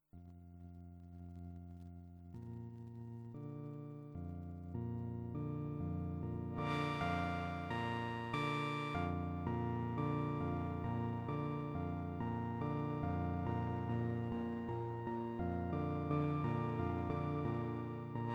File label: Bb arpeg